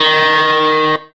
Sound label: electric guitar